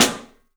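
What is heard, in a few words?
PICOLO SNRS, snare, drums; 3D Sound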